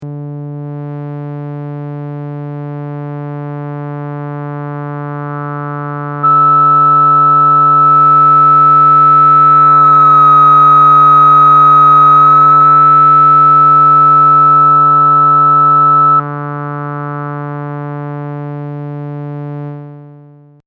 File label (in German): Filter Resonanz hoch und runter